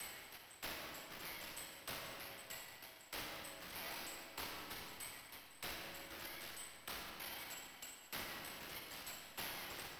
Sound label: Drumbeat trash; Mixter Plus Drumbeat